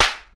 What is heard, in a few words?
slap